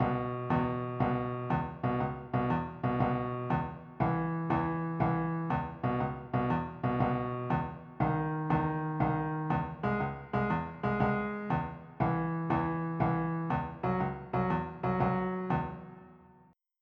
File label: motown; Millennium Gold 2000